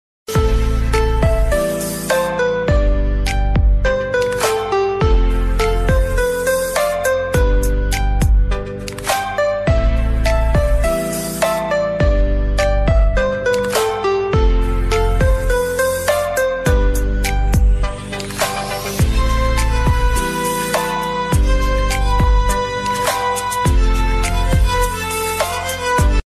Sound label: best tiktok instrumental ringtone